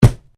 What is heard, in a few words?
PUNCH; Various Organic Foley sounds; Punches and Hits (Sound Effects:Combat); SoundEffects-Foundation4